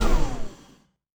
F-5E Fuel Pump End; DCS

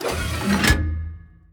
gun reload; World of Tanks

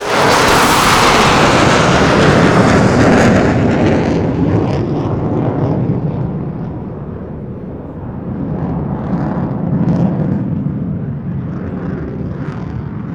mig29 flyby long, vehicles; Call of Duty Modern Warfare

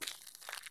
walk asphalt; Escape from Tarkov

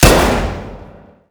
mg 1shot stereo indoor

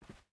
footstep boots cloth walk; Rust

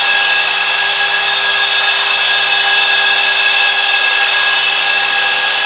Turbine Loop; DCS

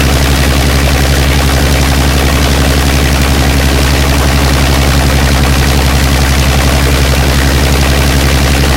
griffon side, engines; War Thunder

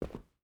footstep rubber boots cloth run; Rust

